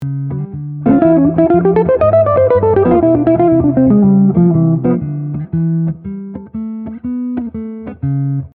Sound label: Lick, Jazz Fusion; Guitar Club Dicembre 2024; Jazz Fusion 15 di Tommaso Costa